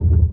bottom bump; Blind Drive

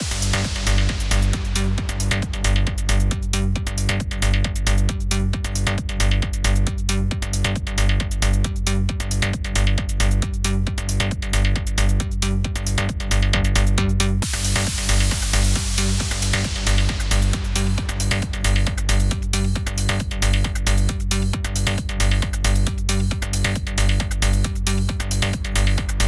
Arcade Theme; Pitch Black Audio Pong

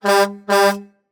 Semi Truck Horn; Blastwave

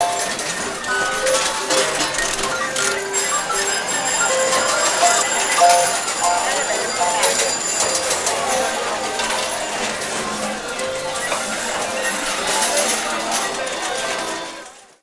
game hall atmo; best service Blue Box

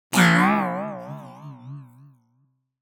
Impact Comic Spring Hit - Metal, Slow Vibration; Hollywood Edge; sound designer's toolkit v2

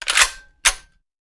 Shotgun Foley; Sound Ideas; Guns

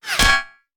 Bullet Impact; Sound Ideas; Guns

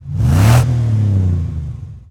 Mustang Rev; Automobiles

